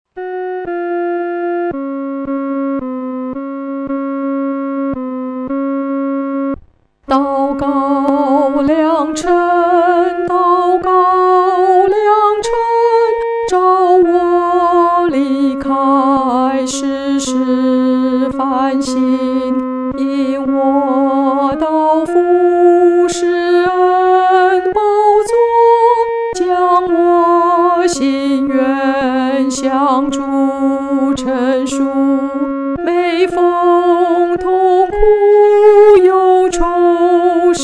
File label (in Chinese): 独唱 第二声